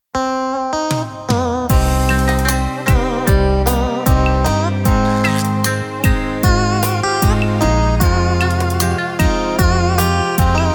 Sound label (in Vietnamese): beat